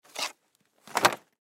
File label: Hockey Gloves Bag, Hockey Gloves Put In Bag; Blastwave